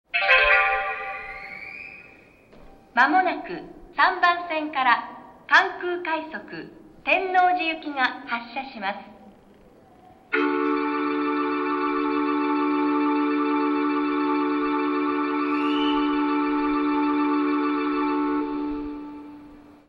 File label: bell, station